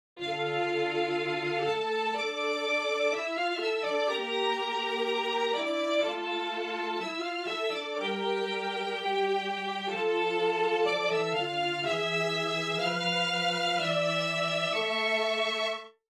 strings original

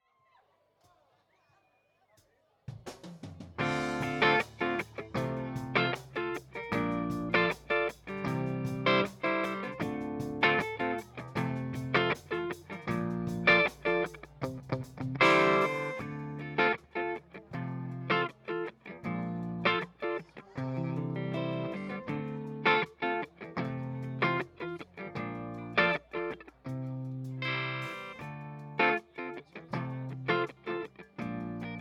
guitar original

